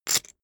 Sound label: poker chip stack big rattle short